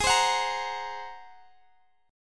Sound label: A sharp 7th Suspended 2nd Sharp 5th